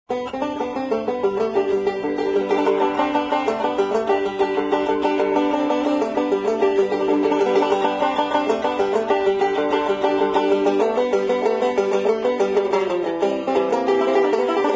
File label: Shredding On The Banjo; Instruments; Zomboid; Lifestyle